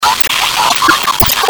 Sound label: chase; slender